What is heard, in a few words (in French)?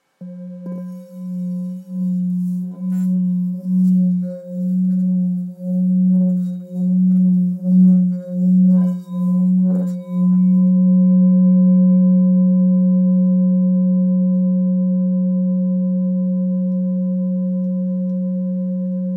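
Bengale do, bols chantants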